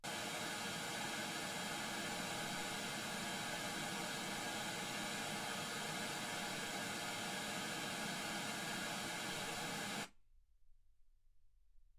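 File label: white gaussian noise 48kHz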